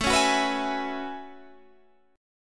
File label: F sharp Minor 11th